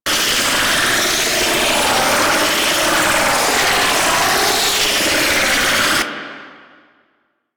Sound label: Chain Loop, Charge Gun; Cycron